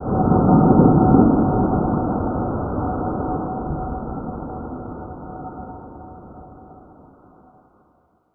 misc amb

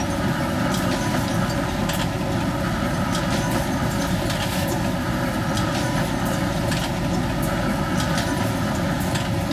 ambience

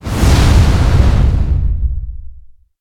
ambience prime laser hit

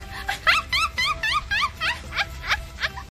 ambience

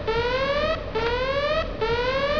alarme

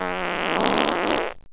fart long

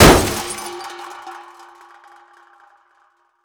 break

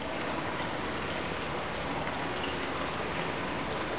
water

